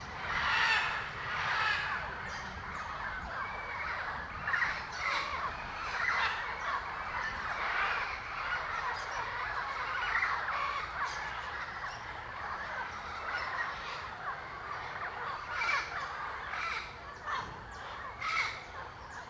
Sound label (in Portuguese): Aves diversas cantando no final do dia; muitos passaros; colecao sonora do cerrado